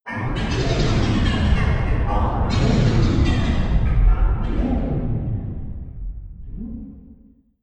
Underworld Motion, Scary Soundscape Sound Effects

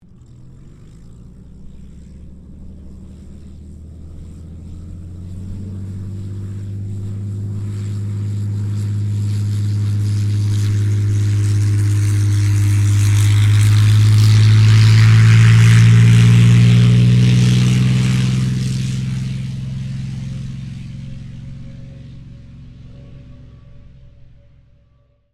AIRCRAFT P-40 KITTYHAWK TAKEOFF; P-40 Kittyhawk / Warhawk / Tomahawk; Airplanes; Vehicles

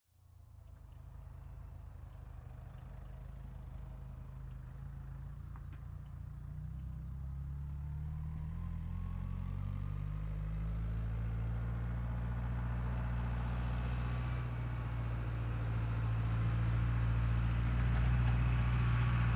Tractor Farm Up Stop Off; Tractors; Vehicles

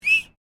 FEMALE WHISTLE SHORT; Female vocal sounds; Whistling Sound Effects; Whistling (Sound Effects:Voice)

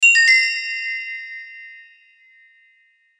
chime bells; Chimes (Sound Effects:Multimedia)

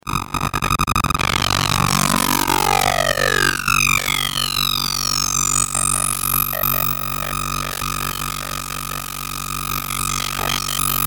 Electrical Fast Chopper Digital Hum; Blastwave

